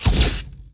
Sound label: archmage explosion; Kingdom Rush Frontiers